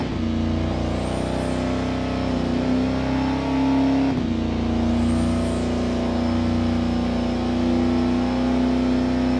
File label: fourth cruise, vehicles; ambulance